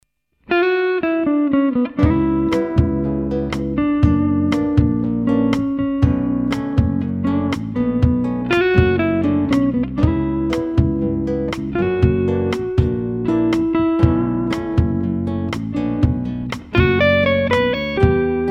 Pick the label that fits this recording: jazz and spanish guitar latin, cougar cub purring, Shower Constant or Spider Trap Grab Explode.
jazz and spanish guitar latin